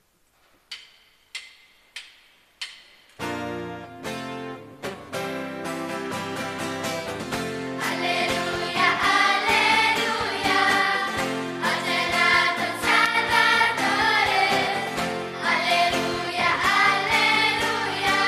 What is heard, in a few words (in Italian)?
alleluia oggi e nato